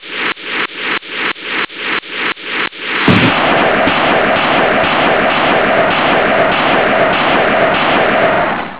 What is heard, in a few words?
alien noise